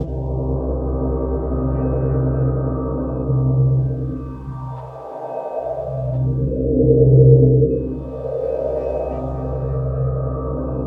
bells

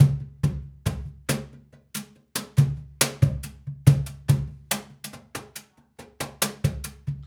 Cajun, Percussion, Drums; Essential Percussion

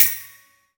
HAT; PlatinumPhattCD1; E-MU Producer Series Vol. 8 – Platinum Phatt

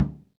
SURDO; Latin Drums&Rain

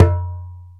Ashiko Gun; Planet Earth; Digital Sound Factory Planet Earth

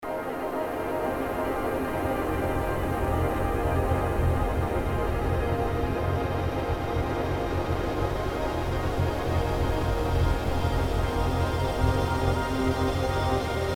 Glitch pads

